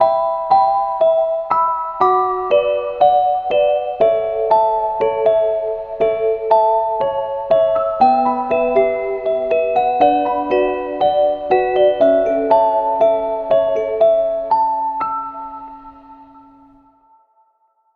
Short Sentimental Lullaby Trailer Celesta With Piano